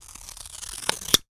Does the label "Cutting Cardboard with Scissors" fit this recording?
Yes